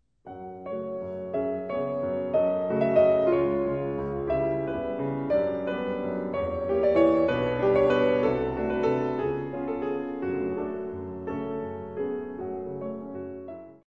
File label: piano, 2 pianos